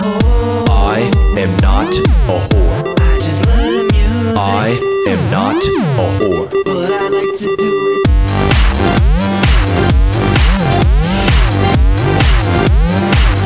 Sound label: trance